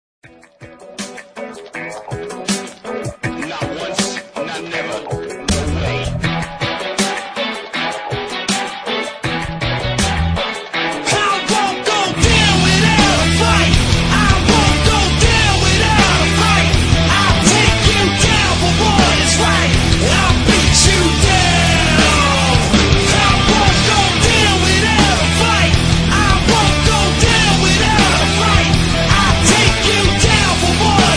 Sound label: beat